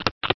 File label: Load Gun